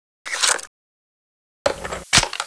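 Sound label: crossbow reload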